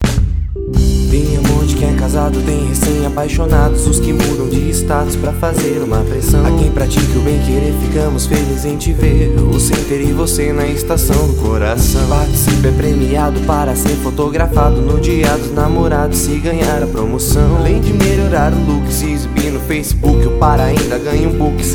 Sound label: jingle; center namorados